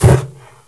flam ball; X-men; weapons

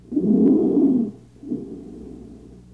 ERROR; Desktop Studio 2000; Nature; MedSkull

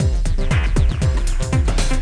Tiptoes; Cloudz 1.0.3

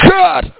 cut

